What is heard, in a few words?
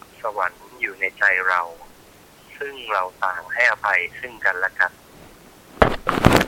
thai